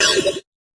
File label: fx drum, Electro FX